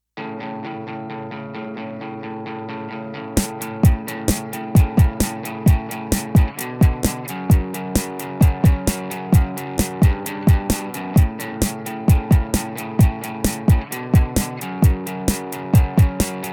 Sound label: accomp